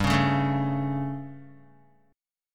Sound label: G Suspended 2nd Flat 5th G Guitar; strummed